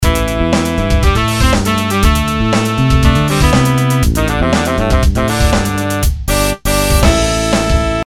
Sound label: Brass section